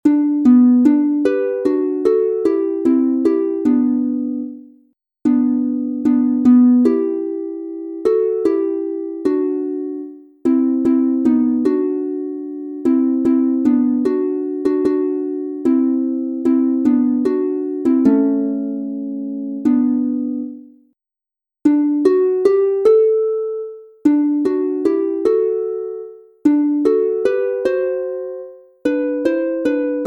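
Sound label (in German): G Dur 2 stimmig instrumental, Harfe; Kuechen und Baenkellieder; Ich kenn´ ein einsam Plaetzchen; Elterngrab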